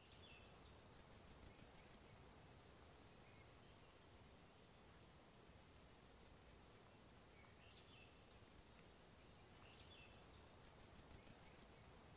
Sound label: songs